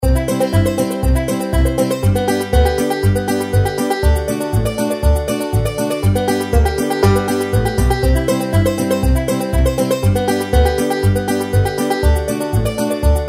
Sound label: farm reelspin fg; games; Farm Mania; Happy Farm